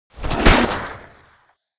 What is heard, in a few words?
whip explosion